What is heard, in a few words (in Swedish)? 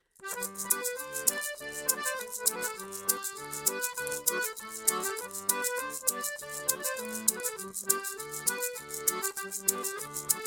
Maraccas; 16 bit